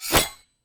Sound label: meleeattack impacts slashing; psfx